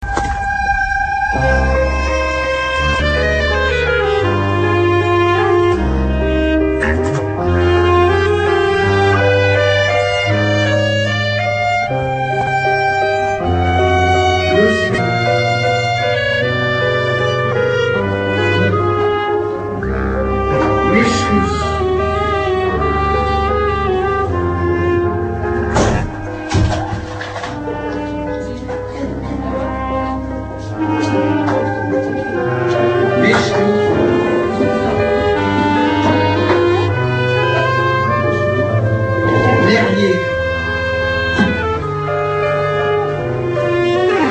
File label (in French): Musique